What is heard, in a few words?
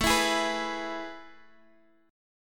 A Major 7th Suspended 2nd, Guitar, Standard; strummed